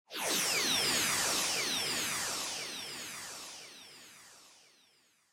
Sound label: Motion Speed Lines Long Delay